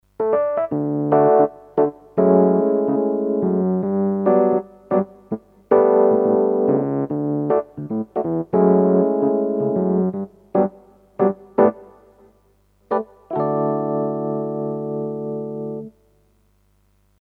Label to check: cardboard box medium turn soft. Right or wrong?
Wrong